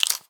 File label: Snap Click; jonnyruss01; pitchglitch